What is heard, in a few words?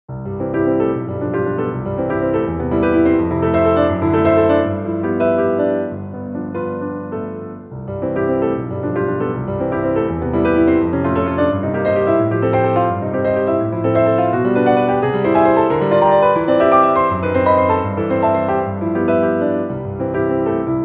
prelude piano; chopin; prelude 1